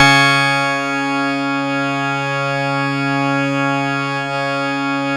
C#, PIANO; Big Fish Audio; Synclav FM

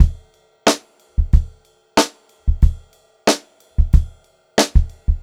Funk-Groove Drumloops; USB Soundscan vol.38; AKAI; 90 s Sample CDs

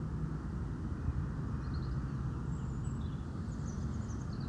Ambi Loop, outdoor samples Ambience Loops

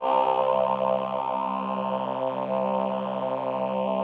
VP-330, String Machines

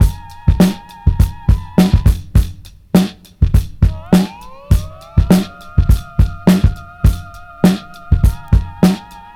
ILL BEAT 102.5 bpm; Planet of the Breaks

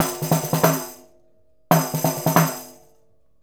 Wood Block and Crasher, Essential Percussion; Combo

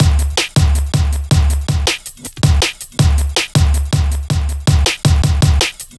Swing, drum loop, jungle, 160.5

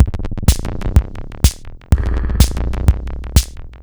125bpm, House Drumloops; 90 s Sample CDs; Zero-G House Fabrik Drumloops; ZG Drumloops

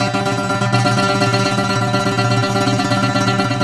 SAZ TREM G 2; Soundscan vol.53; Mediteranean Traditions; AKAI

